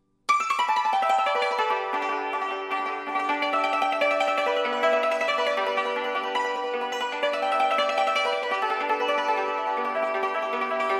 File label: tibetan dance